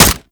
Primary Xbow Shot; Tenno

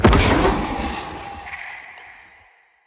Vassal Laser Impact; Enemy; Characters; Abilities; Mendicant; Mnd King

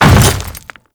Hammer Boss Step Dirt, Footsteps

